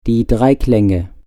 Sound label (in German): Dreiklaenge